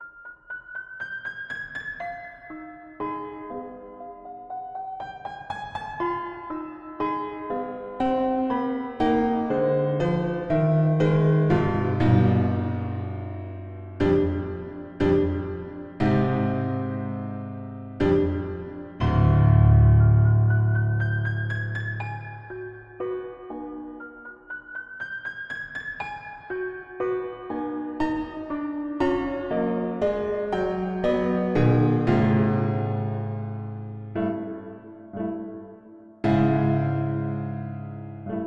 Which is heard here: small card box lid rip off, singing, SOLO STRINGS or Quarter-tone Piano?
Quarter-tone Piano